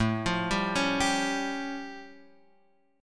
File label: A Augmented 7th A Guitar Half Step Down